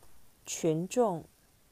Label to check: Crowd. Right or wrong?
Right